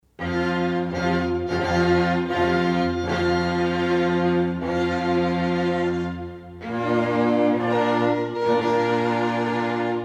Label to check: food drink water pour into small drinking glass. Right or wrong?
Wrong